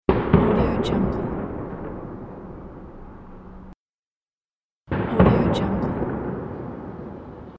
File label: big petard